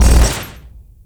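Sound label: raider buggy fire; nod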